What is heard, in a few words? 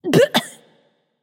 clear throat light; smite; voicepack; hel